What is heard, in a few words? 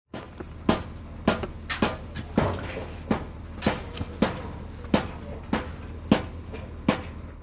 scrapyard hammering knock; nova scrap yard; junk yard metallic and impact fx; nova sound